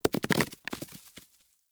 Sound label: Barrel Apple Spill; Objects